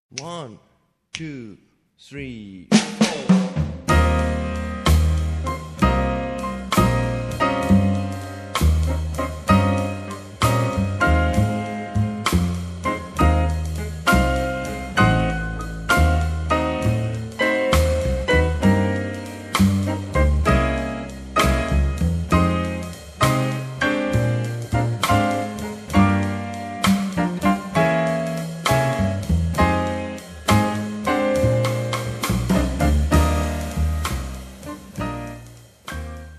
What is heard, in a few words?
Jazz